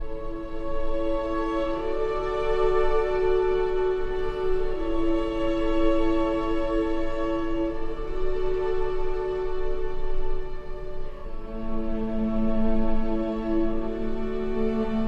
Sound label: for cello and strings; Archi di Santa Cecilia